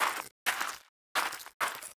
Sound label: Gargoyle Flesh Ripper Movement